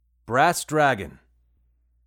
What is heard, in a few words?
brass dragon; bestiary; 5etools